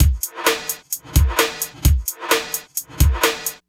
NRG Break, Trance, Drums, Breaks

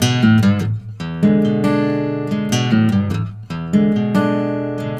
riff, Blues Guitar

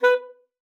B4, ALTOSAX; Xsample VOL-2

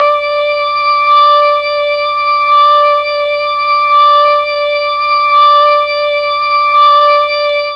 D4 ORGAN, Mellotron MKII; Mike Pinder - Mellotron Archive CD

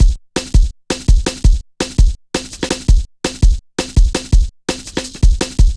Rollin Shaker, Drum Loops 166.5; Jungle

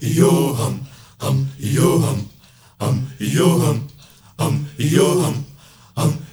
MALE YO, HUMMERS; Spectrasonics; Heart of Africa

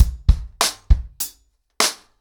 100BPM, ACOUSTIC DRUMS; The Roots Drumloops

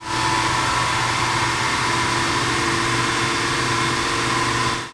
sfx sci fi; Masterbits - Switch FX

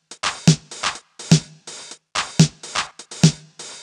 drum loop room top 125, Classic Chicago House; Sample Magic; Drum Loops

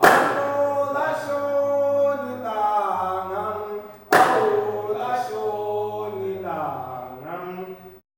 MALE CALLS; Spectrasonics; Heart of Africa 2

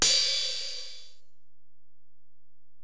Ride, Alesis DM-PRO, Drum Machines

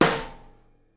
SNARE, Live Snares

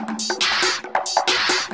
percussion loops, 138, Techno

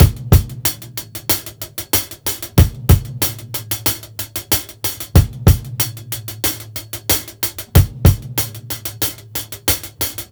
beat, Roots Funk; USB Soundscan vol.41; AKAI

